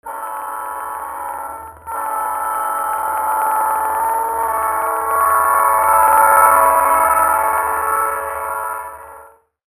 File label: train doppler